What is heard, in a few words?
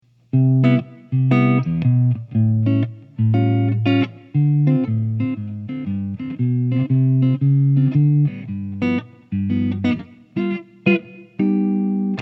Jazz Clean Neck